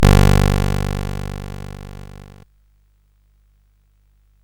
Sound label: Frantic, CSG8580R5